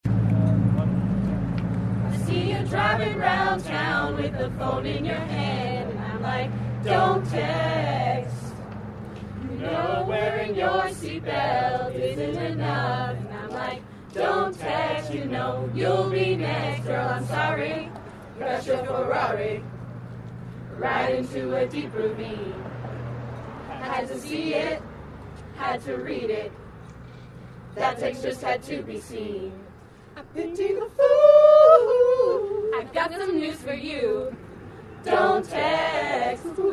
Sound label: jingle; textdrive